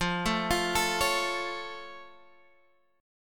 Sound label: F Augmented F Guitar Standard; arpeggiated